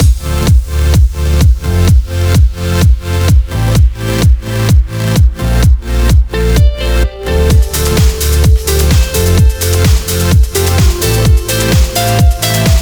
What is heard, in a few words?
128BPM Mix; Vengeance Samples; VFH1 128BPM The Classic Kit